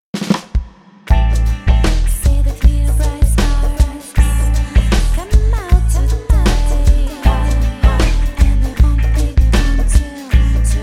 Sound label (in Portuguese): Trilha Lounge